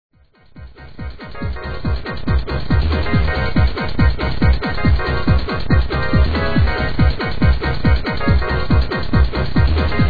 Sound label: Ringtone Dance